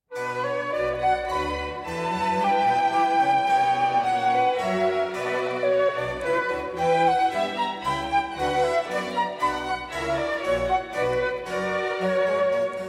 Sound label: orchestral suite, menuet; No. 2 in B minor, BWV 1067